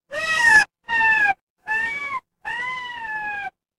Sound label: animal cat meow